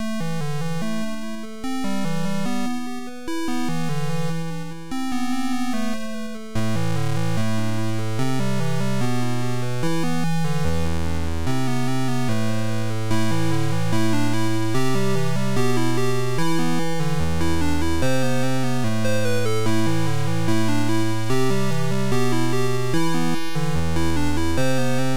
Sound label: Pluck